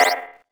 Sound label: STEP NG